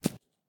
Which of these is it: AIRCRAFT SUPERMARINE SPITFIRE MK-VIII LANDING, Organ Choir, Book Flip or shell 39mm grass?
shell 39mm grass